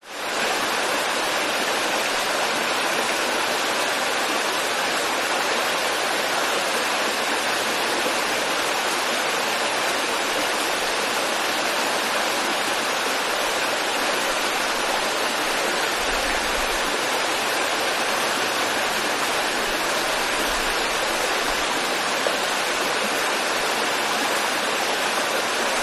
nature creek stream river flowing forest eastern australia